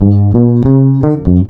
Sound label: BASS LOOP; LOOPBASS KIT; AKAI; Big Beat Samples & More